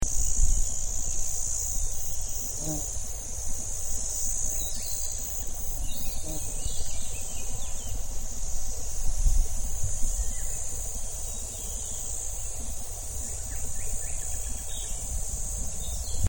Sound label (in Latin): Tibicina steveni